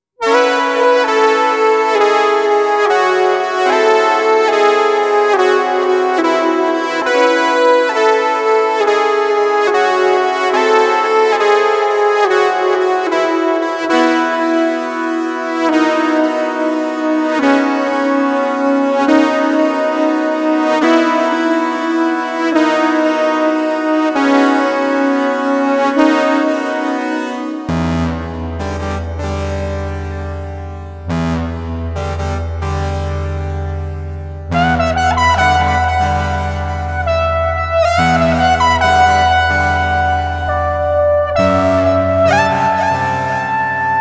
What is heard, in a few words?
ewi